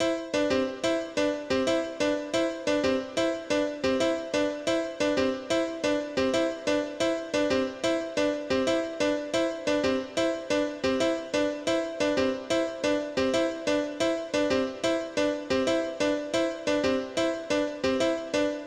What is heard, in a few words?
Dance Piano